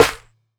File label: boomy snare sound d key